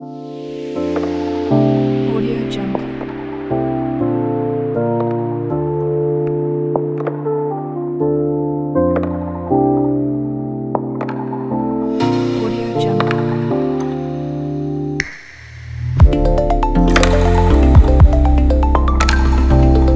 electronic dream

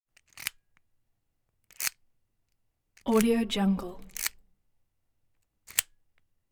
screwdriver mechanical movement metal sliding

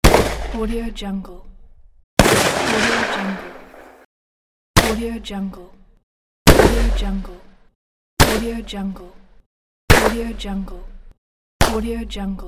gun pack

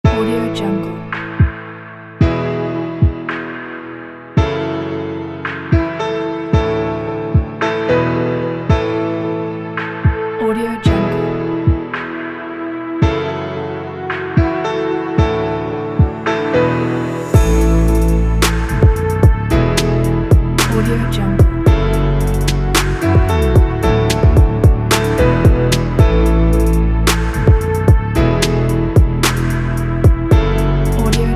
brave beat

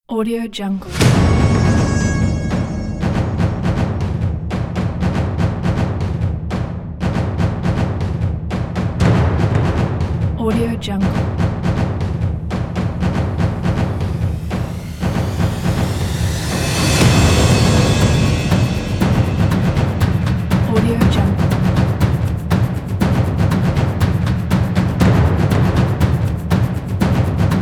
football theme

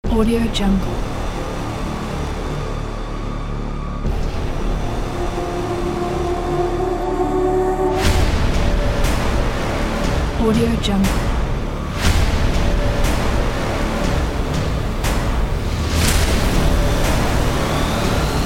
epic hybrid trailer

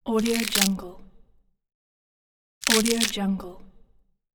flesh

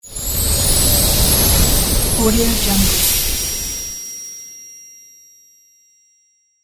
christmas whoosh transition

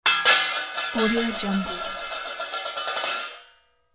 metal bowl falls hits ground

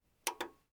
dvd player buttons; prosoundfx

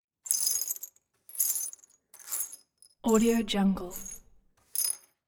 glass shards sweep on table

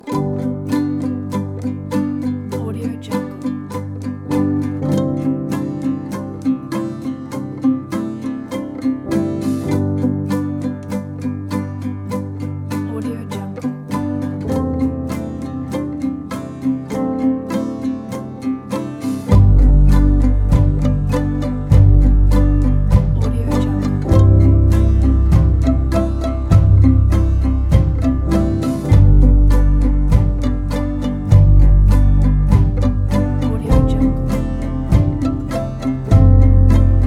warm summer uplifting acoustic folk

